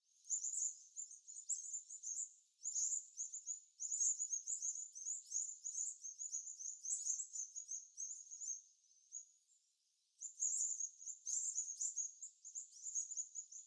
New Holland Honey Eaters

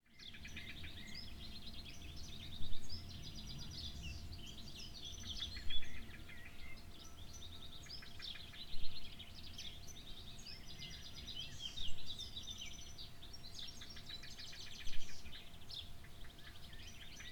Spring Morning Birds Oiseaux Reveil Printemps

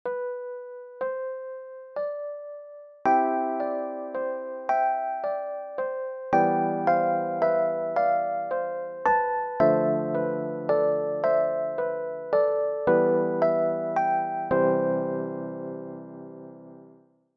Lonely Piano

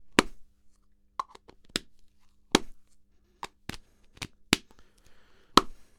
Tennis Ball Back And Forth

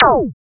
Laser

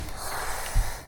Iron Whoosh

